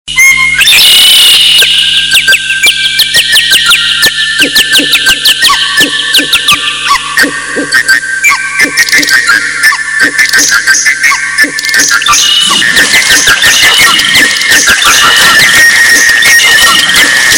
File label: Birds; Airtel